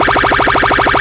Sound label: Scratch